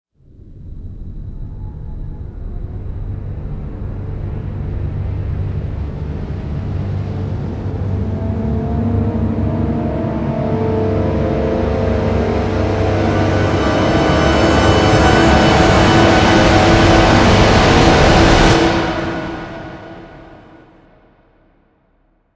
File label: Rise Bullet Time; Brand X Music; Brand X Music Catalogue - Editor's Essentials